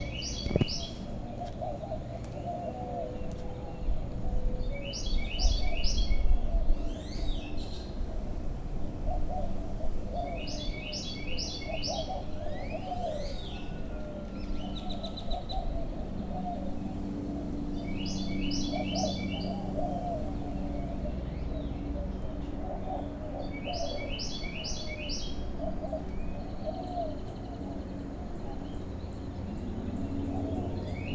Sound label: field recording